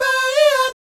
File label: BAYEEAT, Vocal Planet Jazz Fx; Spectrasonics